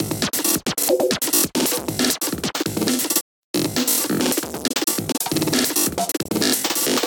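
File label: drum beat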